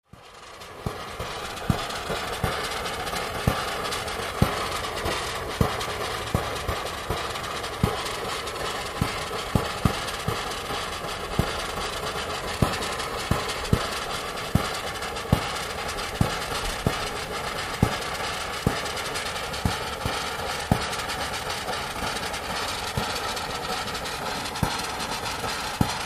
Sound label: Steam Pipe Bubbling